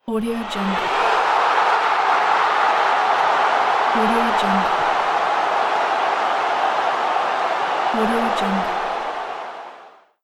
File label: soccer goal